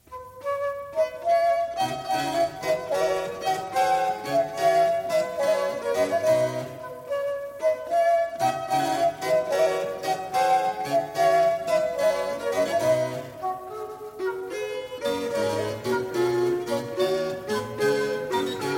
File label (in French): Suite en Ut majeur pour flute, dessus de viole et clavecin, La Bagatelle; Marais